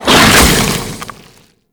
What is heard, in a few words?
Infested Bone Blade Scatter Shot Fire C; Warframe